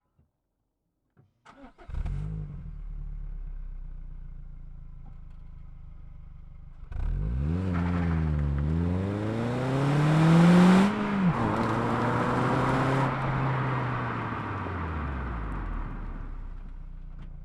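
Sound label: peugeot 307 sw onboard start fast drive stop reverse stop drive stop off exhaust right; Peugeot 307 SW 2004; Pole Position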